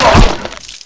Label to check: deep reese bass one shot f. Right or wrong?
Wrong